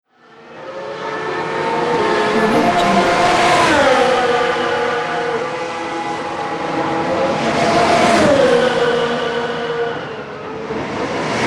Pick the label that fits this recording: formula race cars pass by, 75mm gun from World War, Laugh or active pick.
formula race cars pass by